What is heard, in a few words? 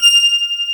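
BRS PF F5, brass; AKAI S6000 CD-ROM - Volume 1; SAMPLE WAVE MIXING 1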